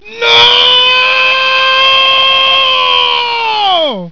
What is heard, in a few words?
mail